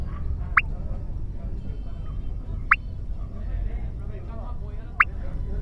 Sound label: Leptodactylus jolyi